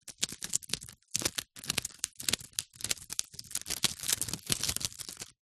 office sticky tape strip handling fingers; zapsplat

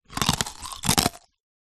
human eat crunch boiled sweet candy

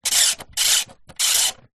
radiator portable analog pin timer turn set; household

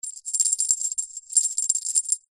bell very small shake ring

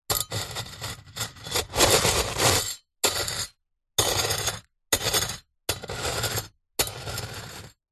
metal scrapes and digs into small stones; foley

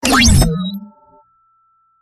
UI CLOSE; PMSFX

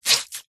cleaning cloth soaking wet squeeze squelch; household

